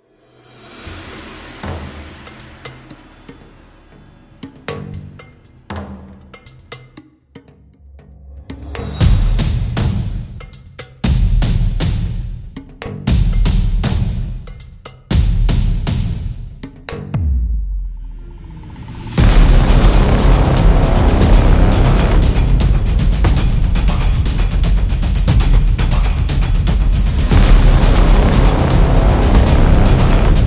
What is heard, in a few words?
Horror Action